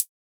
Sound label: Hat